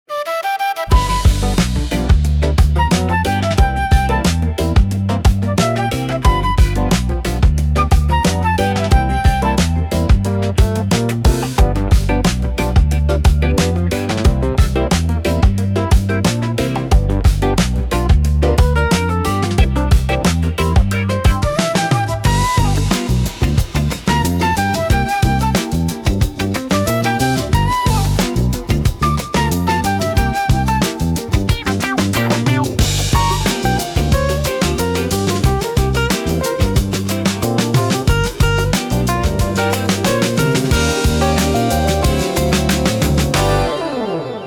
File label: Harlem Street Groove; opener